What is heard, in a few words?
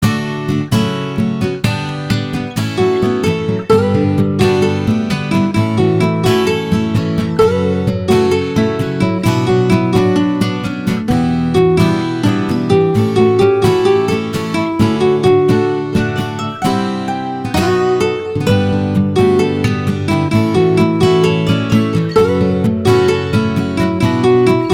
Love Medley - Guitar; Lovederation Sound Collection